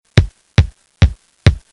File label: dribble, basketball; Nickelodeon Basketball Stars 3; ThrillGames